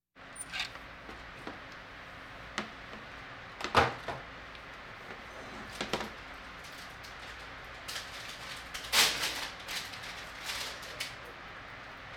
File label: Hospital effects